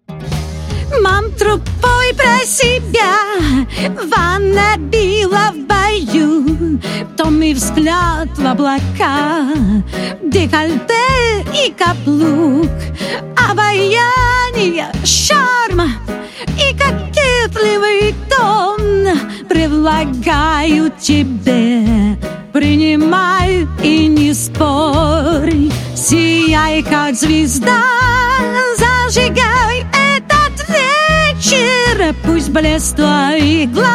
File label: Female Gritty Pop (RVC)